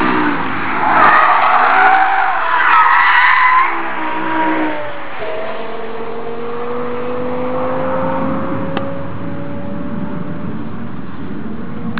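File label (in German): M5 Zehnzylinder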